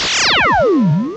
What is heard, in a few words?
RAYGUN; CMI Sound Library Rev 1.3